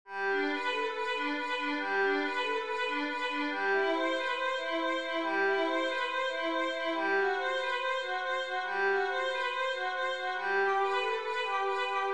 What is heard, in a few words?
prelude